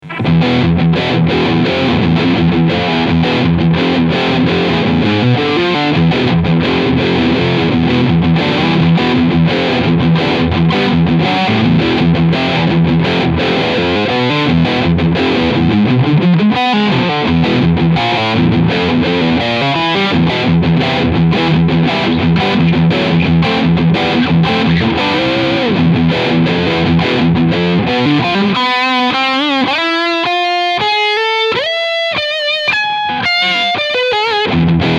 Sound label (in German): Riff Steg Overdrive